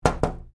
Knocking on window pane

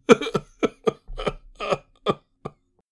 Senior male crying

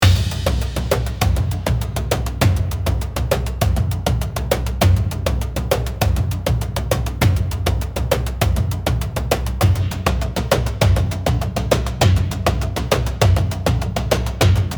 Tribal beat earth drums

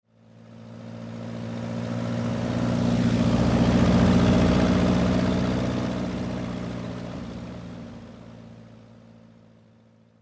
Light aircraft pass by and then fading away; airplane

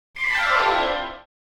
Cartoon orchestral gliss down

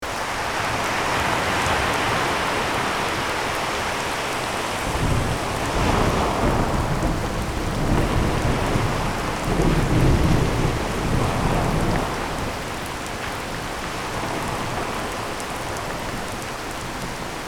Ocean storm ship perspective ambience

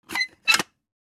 Hamster cage opening

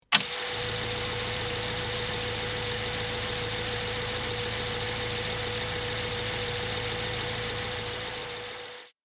Vintage 8mm projector running

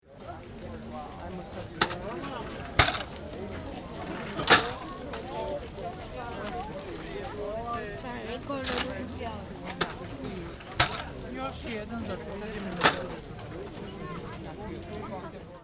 Fruit market crowd medium distant

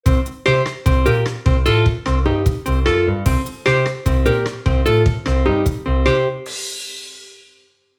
Comical funny moment cheesy band sitcom

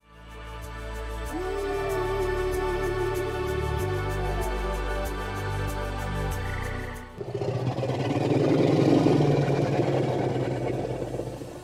Wildlife pack

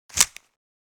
Removing dart from holster

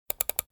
Multiple click

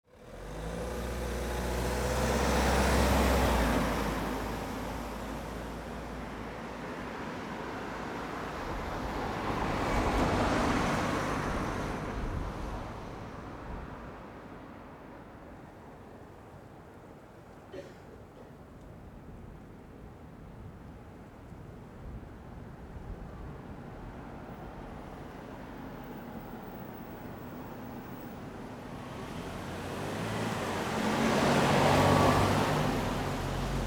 Early morning quiet city street ambience

